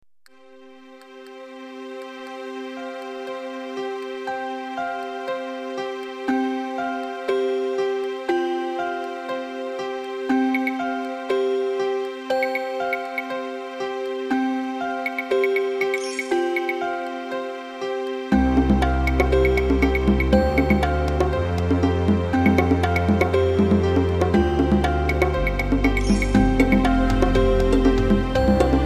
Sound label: rhythm